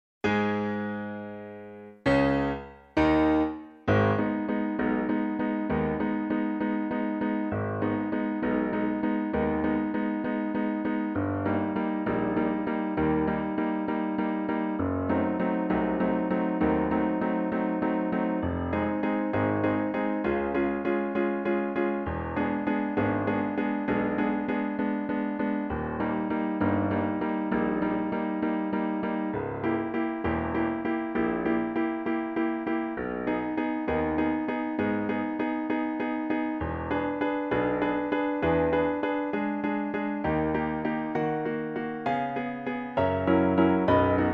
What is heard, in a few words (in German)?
trompetenkonzert; hummel